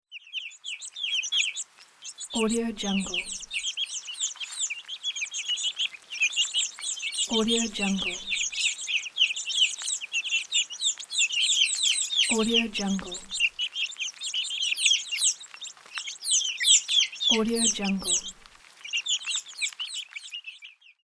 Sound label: chicken